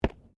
Body movement Organic Impact Light; Body movement foley to simulate falls and deaths; Wrestling And Struggling Sound Effects

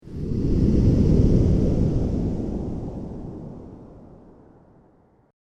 Cool Engines Scifi Fx, spacecraft sound effect

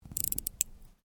Watch Clockwork Wind, Click; Objects

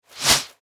Warfare Whip Movement Fast Thin; Whipping; Combat

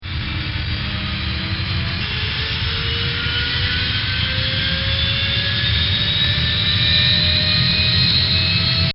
Hawkeye E-2C Powering Up

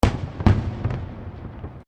Fireworks Multiple Explosions; Backgrounds; Crafted Signals

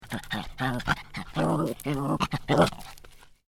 Small Dog Growling; Growl, sneer, small dog.; Animal Small Dog Growling 1

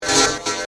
Robot By Fast, Scifi; Science, Fiction; Robots

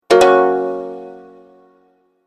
Message Alert, beep

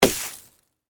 Snowball Impact; Weather; Snow Sound Effects; Snow (Sound Effects:Weather)

